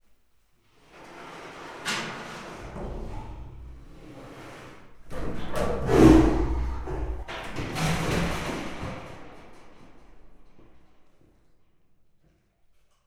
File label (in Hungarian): ketrecnyitás horkantás, bengáli tigris; miskolci zoo 2009